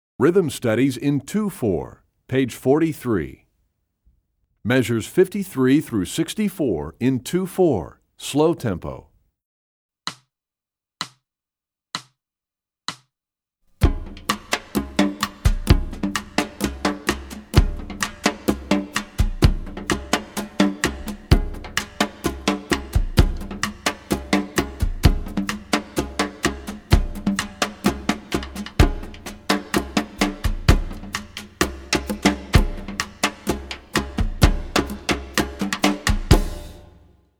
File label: Rhythm Studies, Percussion; EE2000 Book2